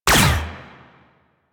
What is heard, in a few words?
Shot, Weapons; Supra Vandal